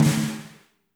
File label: VRB SNR 1T R, SLAM BALLAD; Spectrasonics - Burning Grooves CD2